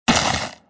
Ice Asteroid Destroyed Light

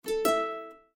Savanna Exclamation; windows 7

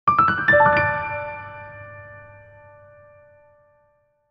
Complete Jingle - Forest; bayonetta origins cereza and the lost demon; One Step Closer!

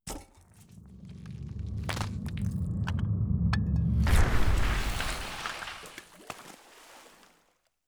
Pool Pillar Break

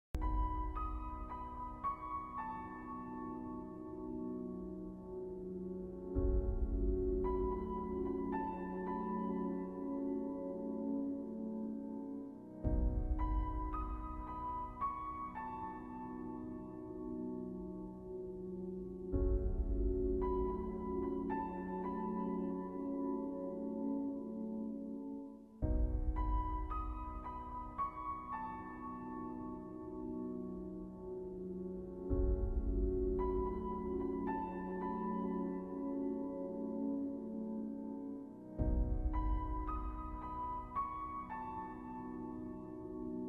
Piano Remix

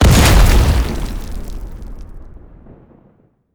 Sfx Rubble Impact; Minecraft Story Mode; Colossus

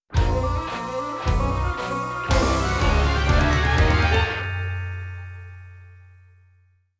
Open Chest

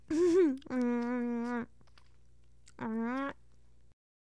Eat; Kitchen; Hilzartov; Fairy Size Doesn't Matter

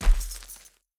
Footsteps Dirt Walk; Devour; The Town

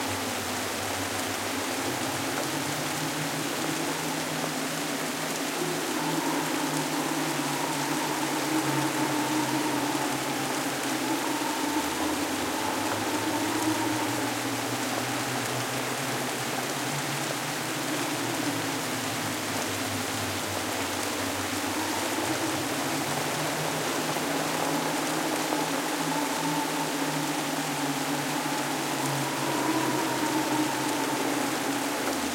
better rain wind

